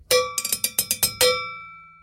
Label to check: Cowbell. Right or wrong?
Right